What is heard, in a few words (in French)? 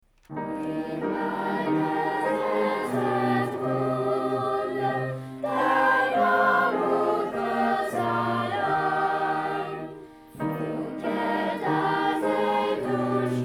Choral de Bach